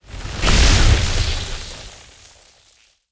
Elemental Magic Spell Impact Incoming

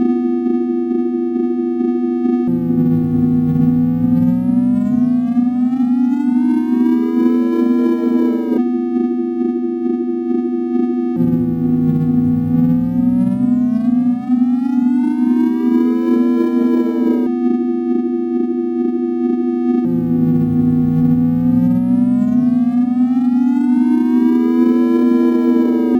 Ambient Laboratory Loop

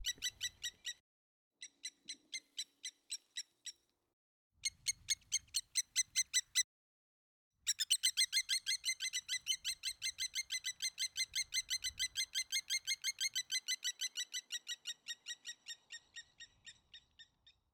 Angry Bird

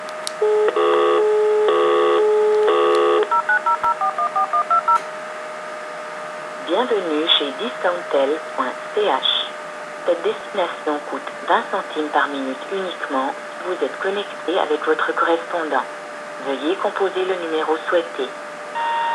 Answering Machine

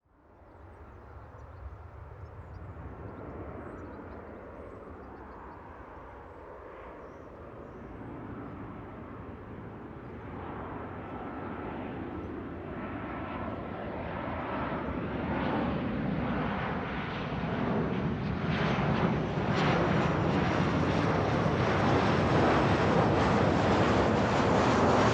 Airplane Flyover Landing In Heathrow

